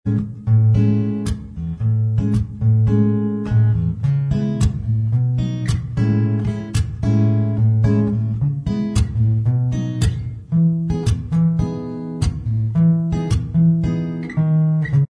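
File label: acoustic, instrumental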